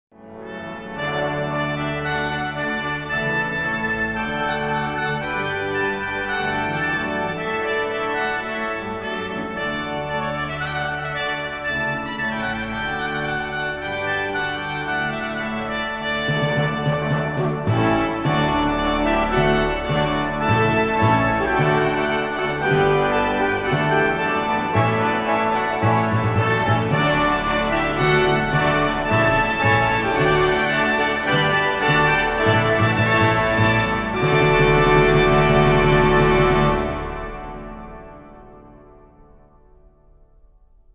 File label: Ritardando